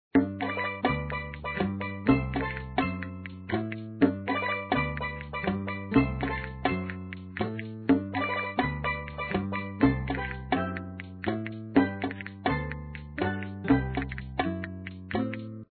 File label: grill screen music; papa s freezeria gamerip